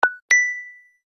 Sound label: Glass, notifications; LG V50S